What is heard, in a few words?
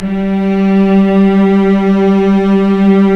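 strings, mf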